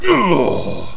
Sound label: death; player; sarge